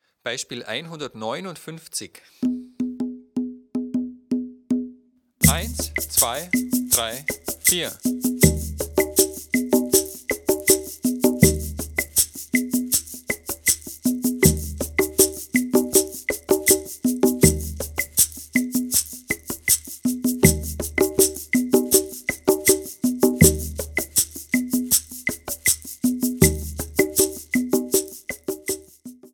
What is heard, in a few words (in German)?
viererrhythmus